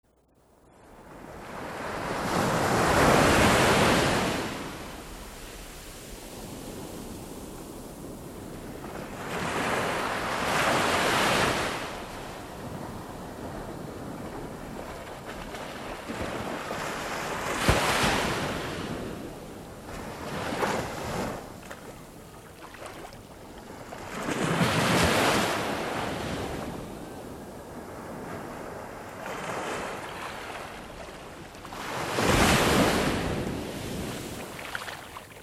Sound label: Ocean Relaxing Surf; Relaxációs zenék; nyugalom; magány